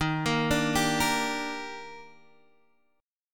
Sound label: Eb Major 7th, Guitar Drop D; down